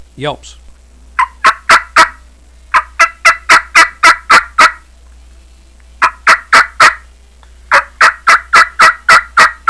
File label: shockin yelps, mouth; shockin cutter